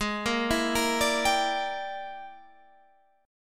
E 7th Sharp 9th Flat 5th Ab, chord

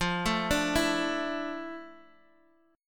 A Suspended 4th F, chord

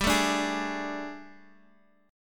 G Suspended 2nd Add Flat 9th G, chord; Gsus2addb9; sus2addb9:1,2,(5),b9; x,x,5,2,3,4

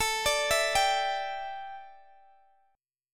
A 7th Suspended 4th Guitar Open G, chord

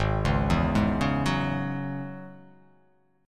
G sharp 7th Suspended 4th Sharp 5th G sharp; G#7sus4#5 arpeggiated; 4,4,2,1,1,1,x,x; JGuitar

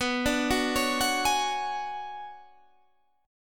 B Minor 6th Add 9th B down Guitar Standard; Bm6add9 arpeggiated; JGuitar

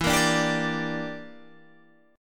E Minor 7th Flat 5th E, Guitar Open G

